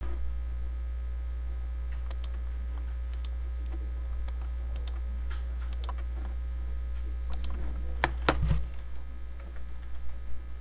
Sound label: stomp